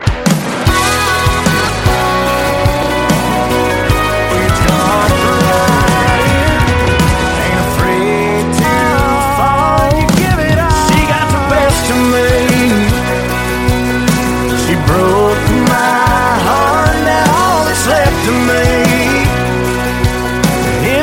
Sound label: Country